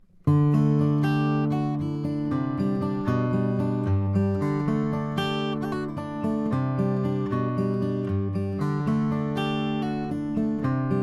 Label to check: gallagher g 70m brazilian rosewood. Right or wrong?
Right